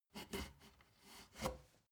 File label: step ladder wood scrape soft single; schritte